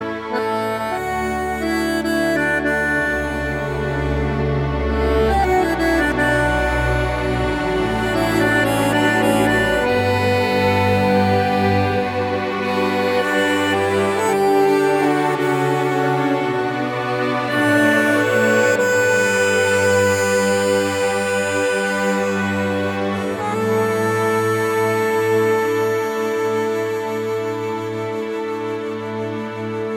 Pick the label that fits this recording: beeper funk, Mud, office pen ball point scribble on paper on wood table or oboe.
oboe